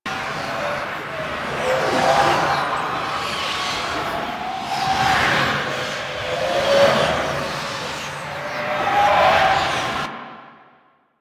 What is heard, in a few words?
Apparition Loop; Warframe; Dagath